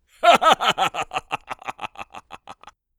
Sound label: male laugh; Gendered social sounds